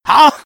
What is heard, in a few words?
jump; freak fortress 2; some clean trash